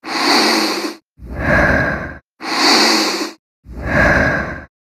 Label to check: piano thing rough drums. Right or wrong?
Wrong